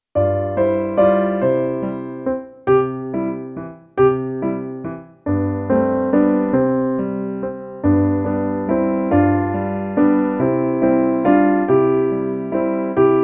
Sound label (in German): Kuckuck in C